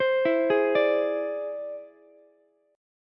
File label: E Augmented 7th E down Ukulele; E+7 arpeggiated; JGuitar